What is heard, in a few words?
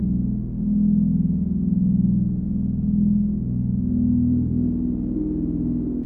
E drone